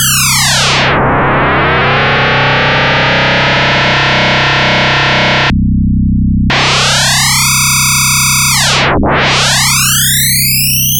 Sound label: pm inner sweep